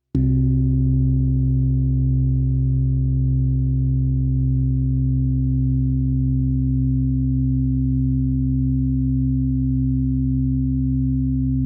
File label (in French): plaques harmoniques C2